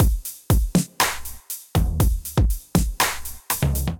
Break Lab Kit, Drums, Hybrid; Ableton; Factory Packs; Drum Essentials

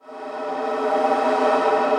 Steel Shards, Dark Textures

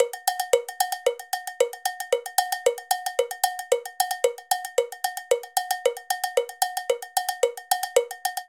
Calypso B Cowbell-113bpm; Latin Percussion; Ableton; Factory Packs

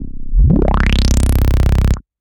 Mud Funk; Bass; Ableton; Factory Packs; Chop and Swing

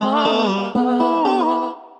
Sliced Melodies Root E, Sliced Vocal Phrases; Ableton; Factory Packs; Voice Box; Harmonic Vocal Racks

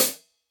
HIHAT closed side tight

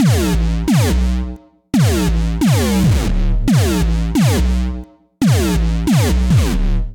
G Minor 138 bpm, bassline; MIDI Clips; Tonal; Boulder Drop; Skitter and Step; Ableton Factory Packs

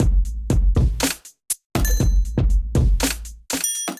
Kit, Drums Hybrid; Chekov Kit; Ableton; Factory Packs; Drum Essentials

